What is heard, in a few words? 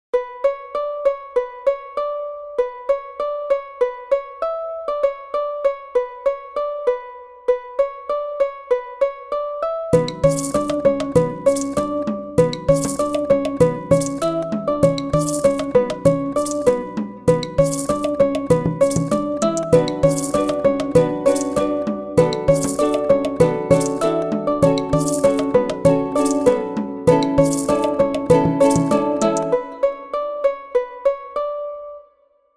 aloha ukule; aloha ukulele; tiki instruments; chiqui audio